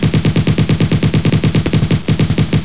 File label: ak47 shots